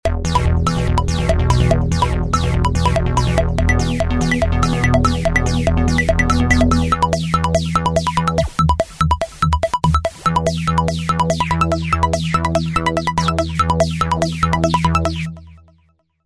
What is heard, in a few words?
Poppin'corn, Roland V-Synth